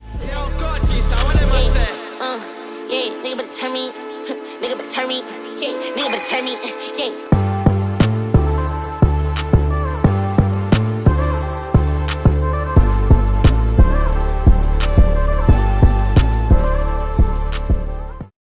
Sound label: instrumental speed song